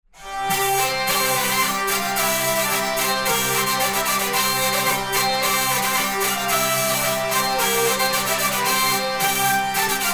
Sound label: Polka